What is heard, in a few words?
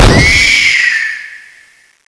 attack special; CSO Cronowing; cronobotics54